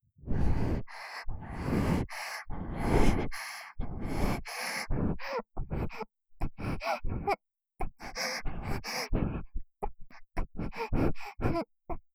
喘ぎ声

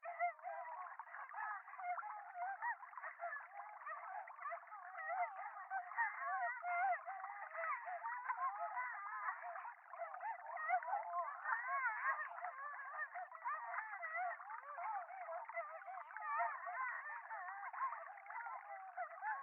電話 喘ぎ 川のせせらぎ

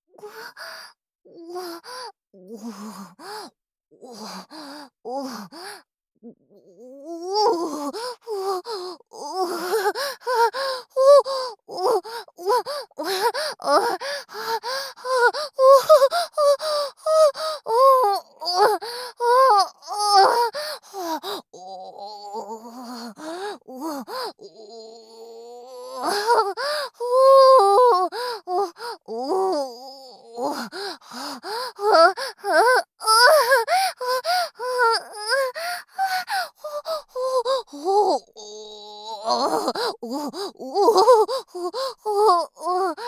オホ声; SEなし

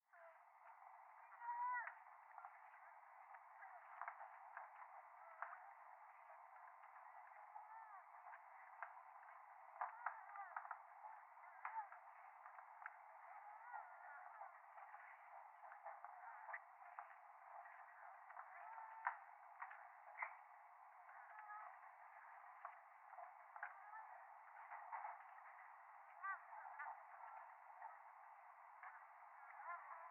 電話 イラマチオ 電車

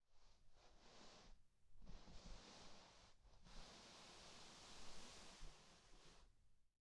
衣擦れ 正面 近→近; 効果音

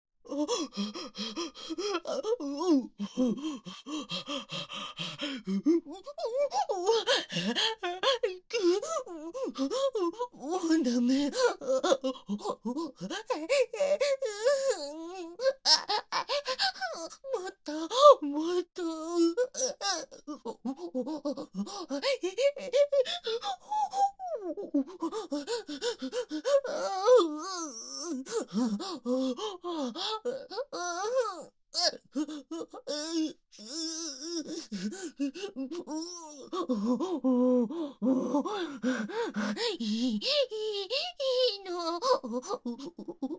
オホ声; SEなし

